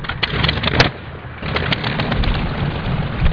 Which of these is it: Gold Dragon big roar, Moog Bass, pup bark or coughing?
coughing